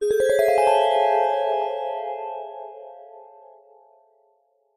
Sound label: fantasy magic mystery glissando bell